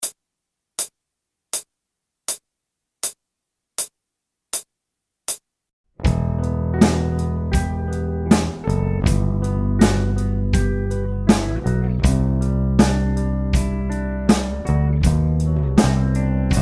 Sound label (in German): 8-tel Play Along mit Drums